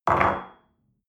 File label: espresso place on table medium